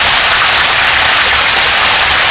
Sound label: waterfall; PC Gamer IT CD 41; Daikatana